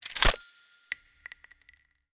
cartridge pop up

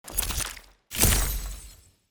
ui receive button

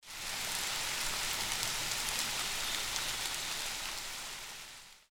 sandbox station rain

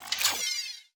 mission fail

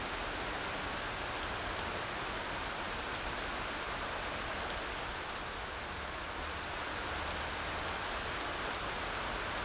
light rain loop

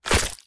worm

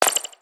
triangle

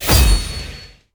gold sword

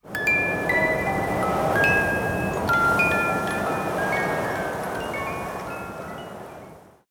skill music box

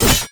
sword

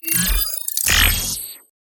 ui disconnect

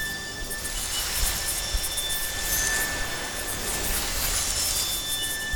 magic write

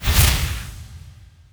sword summon

